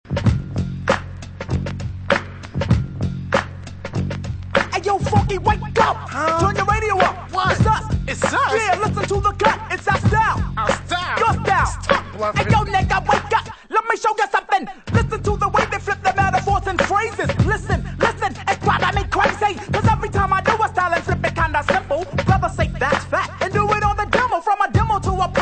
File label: Drumapella intro